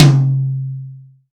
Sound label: acoustic tom drum c sharp key